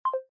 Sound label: lose